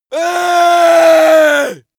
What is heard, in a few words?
male battle cry; schreie; menschen